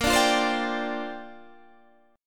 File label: C 7th Suspended 2nd Suspended 4th Bb